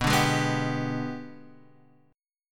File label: Ab Minor Major 7th Flat 5th